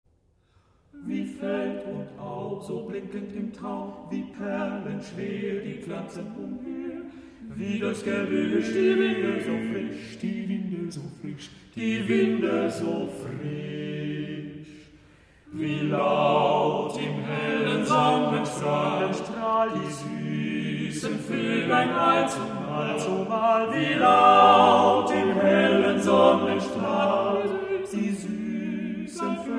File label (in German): Sommerlied; Mendelssohn